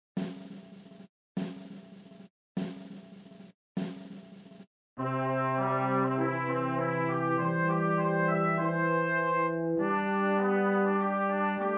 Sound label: Trumpet March instrumental